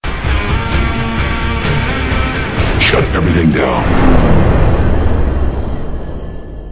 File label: Alienware Logoff-Shutdown; Windows Never Released Soundpack 1